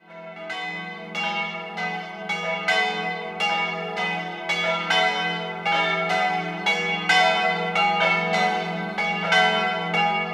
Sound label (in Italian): Gleris, campane